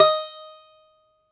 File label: DOMRA, Russian Strings; E-MU Producer Series Vol. 7 – Old World Instruments